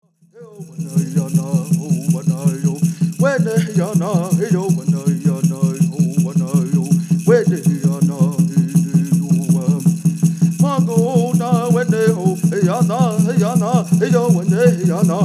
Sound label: Ceremonial Song